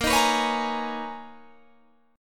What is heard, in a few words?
B Major 13th A sharp, guitar